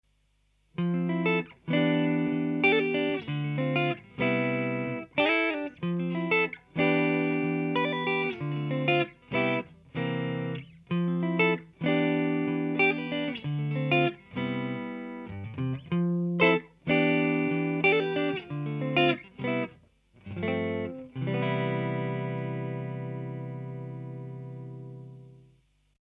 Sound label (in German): Clean Chords Hals